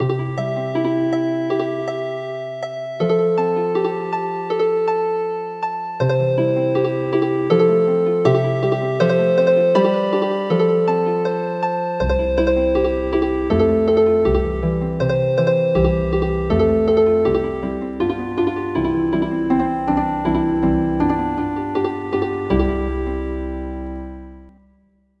Healin Harp

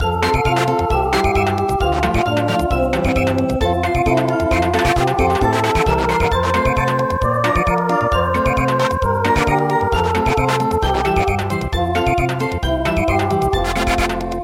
Samba

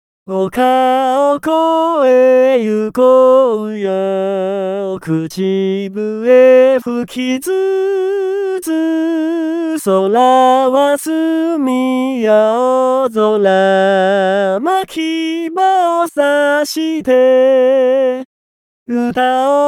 male, vocal only; midi traditional vocal; Picnic

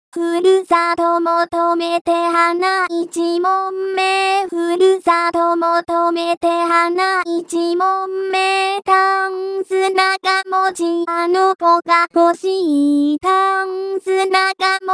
hanaichimonme vocal only

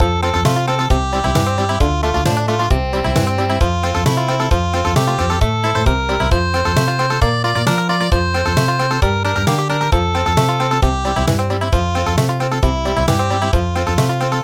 Country, traditional; midi traditional; kkogkkog yagsoghae

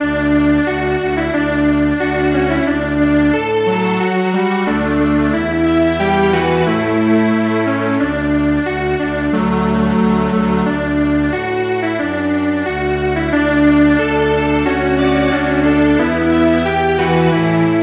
Village dance Strings Quartet; traditional; gangar

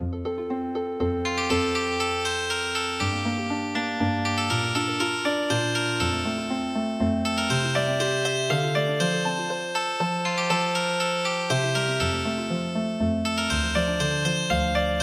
Healing Harp; traditional; Ich hort ein Sichelein rauschen

